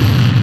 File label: kik sines kick